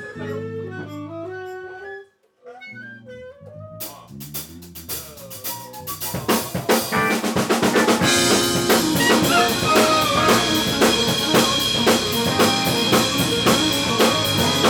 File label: Voice Guitar Solo; Dress Rehearsal